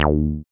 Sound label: SYN 303, Roland TB-303